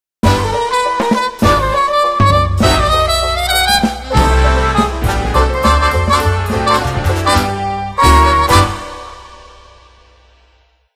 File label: Level Complete; Buildalot